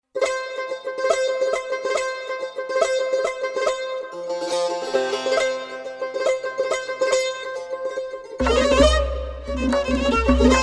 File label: Chahar Mezrabe Dashti (Se Navazi); Ab Nan Avaz